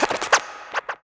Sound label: loop; X-Static Goldmine 3